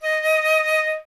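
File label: flute D#; Miroslav Vitous; Solo Instruments VOL-2; Solo 2 Bank 1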